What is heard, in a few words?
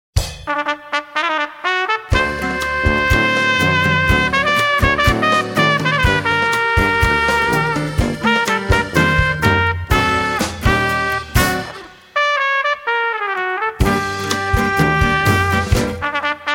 dixie tango